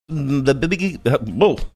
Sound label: making some funn mouth sounds